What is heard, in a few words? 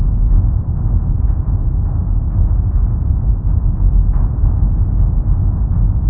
main rotor damage layer; War Thunder